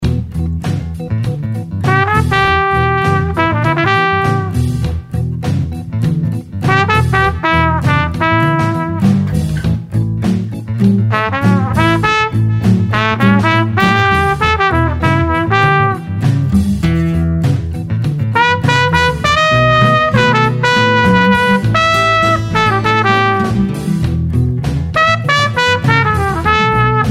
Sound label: Blues In E 100 Solo Trumpet; Blues Solos